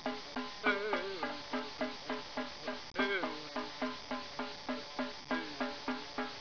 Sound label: TOM TOM; 10,000 Graphics Pack Volume 1; Media Graphics International